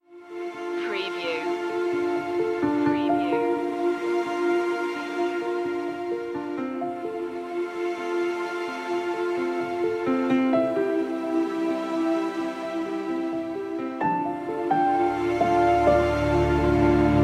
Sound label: passing time, ethereal